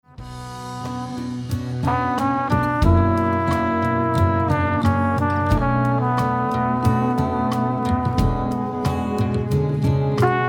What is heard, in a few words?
horn